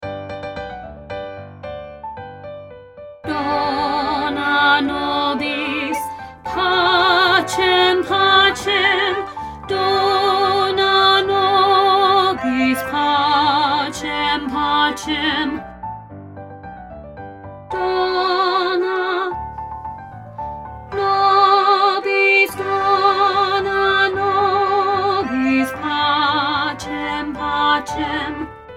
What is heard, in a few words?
Dona Nobis Pacem, Alto Dominant